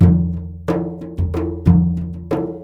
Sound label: MLY DRMS; Spectrasonics - Heart of Asia CD2